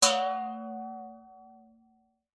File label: impacts metal bowl water hit metal spoon vibrato; zapsplat